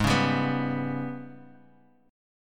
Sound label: Ab Minor Major 7th Double Flat 5th, Guitar, Open F; strummed; 8,8,7,6,x,x; JGuitar